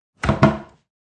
Kitchen Cabinet Door Close, Single bang as a cabinet door shuts; Door Closing